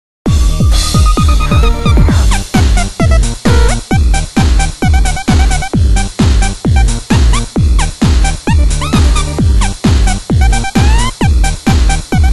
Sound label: Techno Ringtone; NewTechno